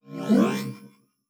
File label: Teleport, Sci-Fi Sounds